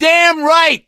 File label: positive noise, gambler, survivor voice; source engine; left 4 dead 2